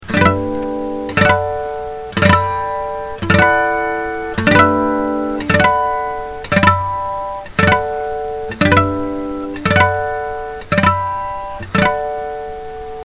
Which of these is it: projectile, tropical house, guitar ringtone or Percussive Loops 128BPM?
guitar ringtone